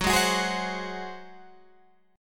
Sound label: F sharp Suspended 2nd Flat 5th F sharp, Guitar Open G